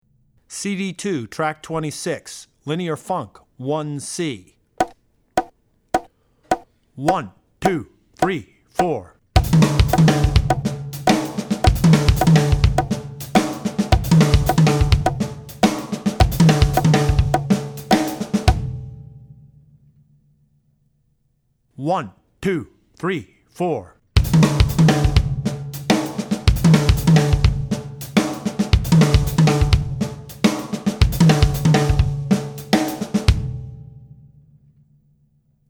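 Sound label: Funk - Linear Funk, Drum Tracks; CD2 Track26 Linear Funk (Example 1C); Drummer's Bible Tracks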